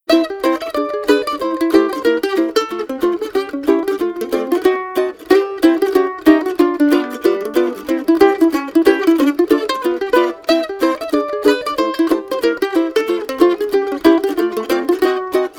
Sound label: Mando